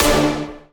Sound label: punch D5